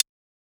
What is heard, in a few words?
hats, Pop; Drums; Slate; SD PASADENA Pop Sample Pack